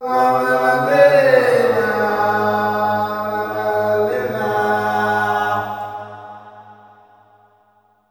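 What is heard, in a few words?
MALE MOURN, MALE CALLS; Spectrasonics; Heart of Africa 2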